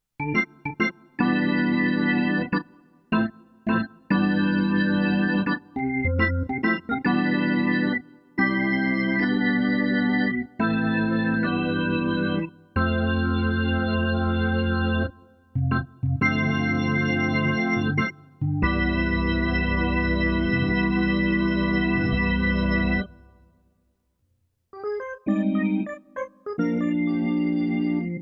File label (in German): Orgel